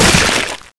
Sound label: spider bite; tarantula; svencoop